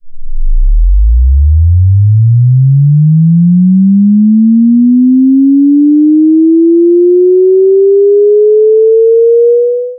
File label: Linear Tone Sweep; subwoofer